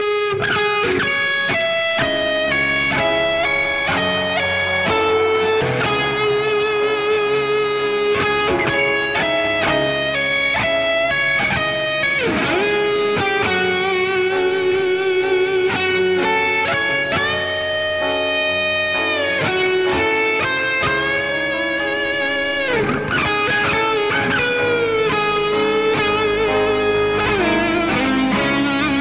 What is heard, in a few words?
guitar solo; godfather